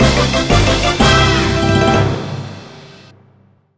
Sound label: Result post jingle best new